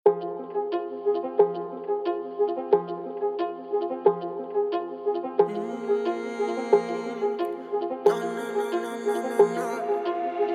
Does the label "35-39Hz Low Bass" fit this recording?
Yes